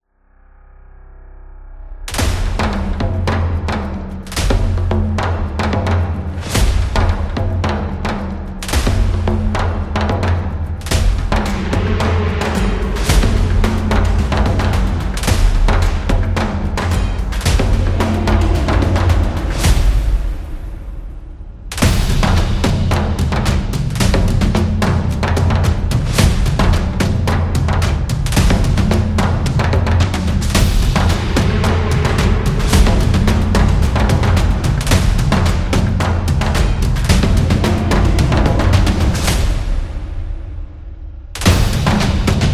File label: Africa Drum